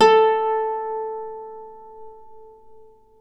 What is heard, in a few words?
Celtic Harp, Plucked Strings